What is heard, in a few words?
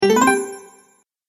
Game Sparkle Sounds; Video Game Sound Effects